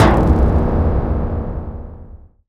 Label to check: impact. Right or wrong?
Right